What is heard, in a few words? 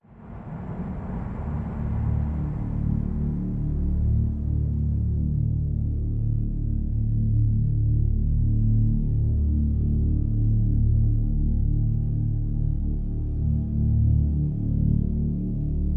Pads Only